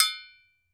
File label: TOKE BEL, Drums&Percussion, Toke Bell